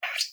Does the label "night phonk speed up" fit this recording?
No